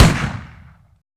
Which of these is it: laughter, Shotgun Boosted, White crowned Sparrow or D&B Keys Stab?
Shotgun Boosted